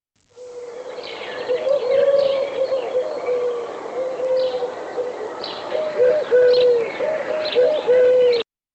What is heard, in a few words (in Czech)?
Hrdlicka zahradni